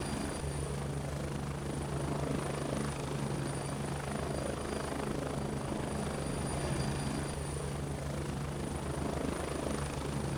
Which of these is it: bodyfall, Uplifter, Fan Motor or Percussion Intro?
Fan Motor